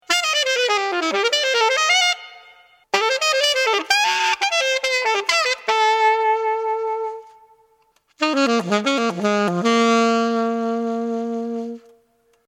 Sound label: Alto; TheoGAIAHR6